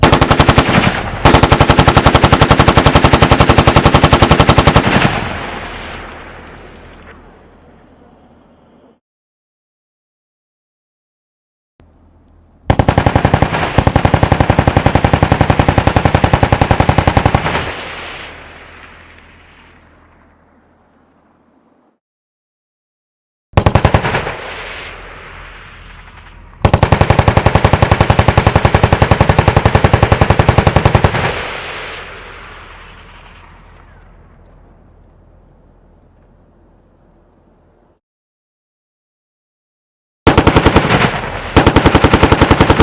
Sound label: warfare mg firing close projectile tail large field; mkh8020 or mkh8060; telinga